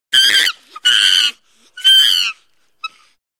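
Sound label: shimpanze golos, chimpanzee voice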